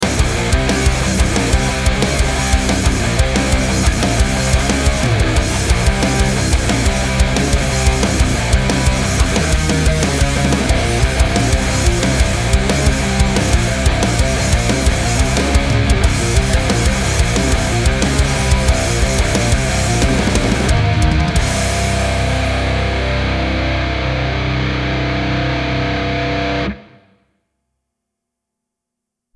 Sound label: metal aggressive 90 bpm short